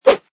Karate Swish, Karate movement swish: Arm or leg swing movement, kung fu whoosh; Combat Swings